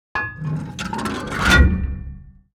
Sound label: gun reload; World of Tanks